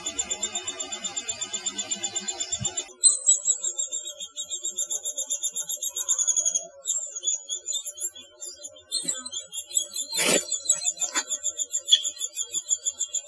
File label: birdsong